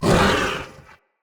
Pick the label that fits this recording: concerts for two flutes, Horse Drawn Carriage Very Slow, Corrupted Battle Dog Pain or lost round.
Corrupted Battle Dog Pain